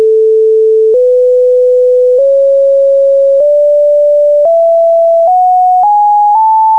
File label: SCALE